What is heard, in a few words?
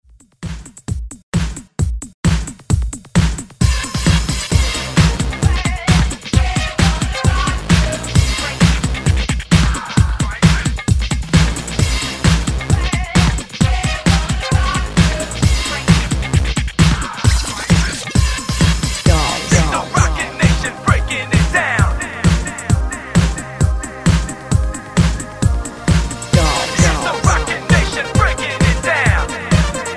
Scratch Re Drum 132Bpm; DJDOLLS